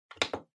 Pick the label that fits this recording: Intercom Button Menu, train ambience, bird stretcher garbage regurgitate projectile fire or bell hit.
Intercom Button Menu